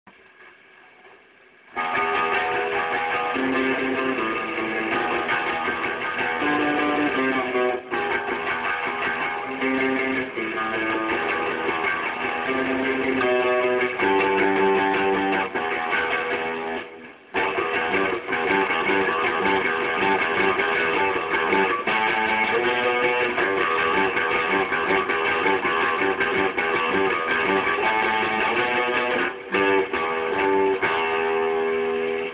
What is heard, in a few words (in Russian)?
Hard, Играем на гитаре